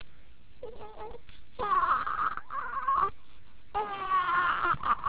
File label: cry